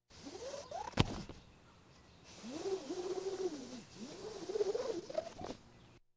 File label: Police, Fire engines